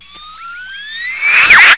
Cartoonish Sound; PC; MARIOTEACHESTYPING2

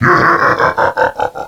gwahahahahaha; DS; ML BOWSERS INSIDE

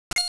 exclamation; GBA; MLSS

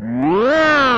roar; baby Bowser; N64; YOSHIS ISLAND

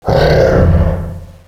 uggh, Echo (inside caves, underground ect.); DS; ML BOWSERS INSIDE

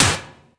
Futuristic Sound; PC; MARIOTEACHESTYPING2

